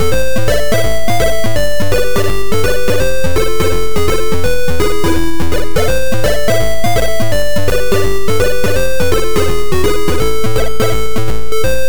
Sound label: In game, ST; Black Lamp; CNGSoft